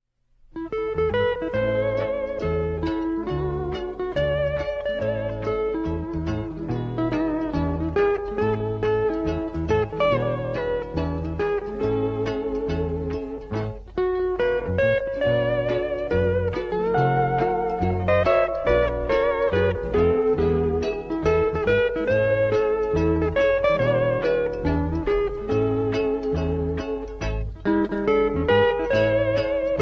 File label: ukulele; spongebob